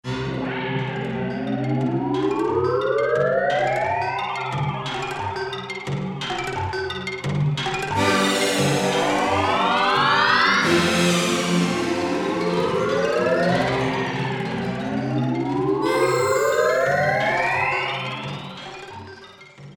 Native Dance; Roland U-220; Factory Patches

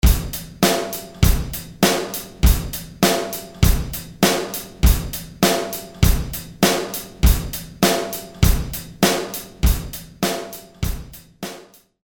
Modern Rock Drums; Apple GarageBand 2; Apple Loops

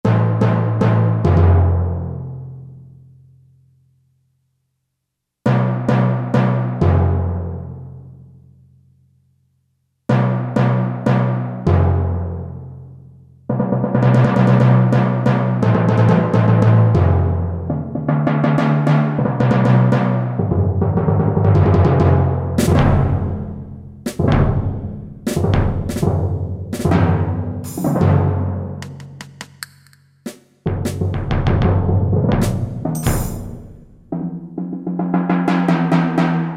ORCHESTRA, Factory Rhythm Sets, Roland SC-880

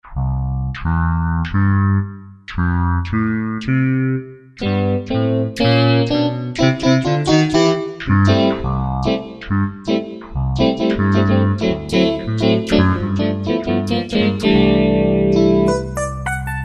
Cha Voice, Korg T3

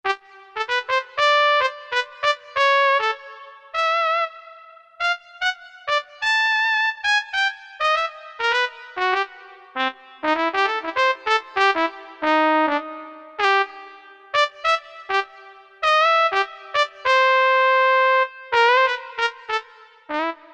Cornet, Korg MSC-4S Orchestra; Orchestra 1

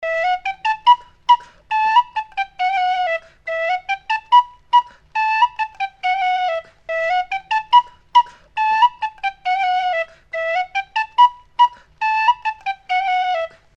Mediaval Flute; Apple GarageBand 2; Apple Loops

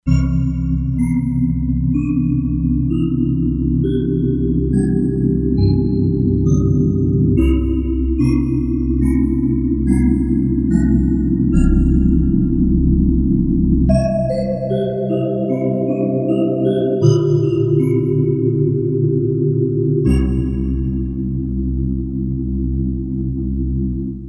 Spectrum, Synth

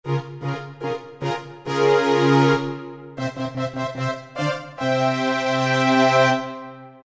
Horns and Strings, Roland PN-D50-01

